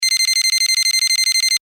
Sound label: Beeps Data Transmission Technology Countdown Fast; Beeps (Sound Effects:Multimedia)